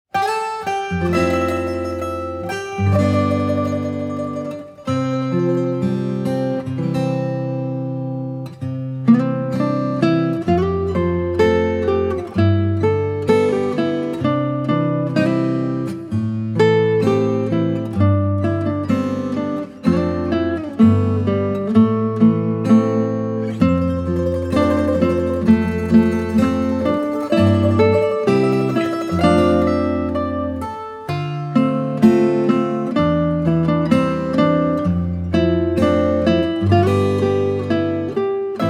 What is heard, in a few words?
Guitar Ver.; TVアニメ「大正オトメ御伽話」音樂集; 白鳥ことり